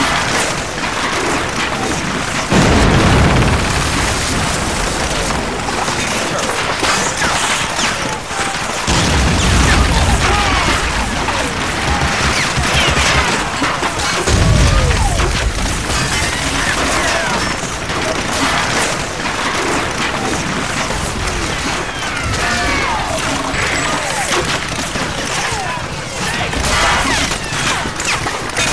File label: beachhead, ambience